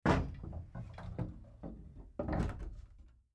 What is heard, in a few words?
Closet Iron Board, Closet Attached Ironing Board Put Board Back Upright; Closet Doors; Household; Blastwave